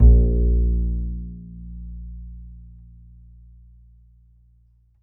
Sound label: E, E string upright bass; Larry Seyer